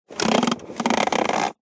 impacts metal small tin like hollow bend pressure creak